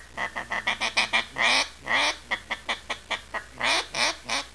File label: barn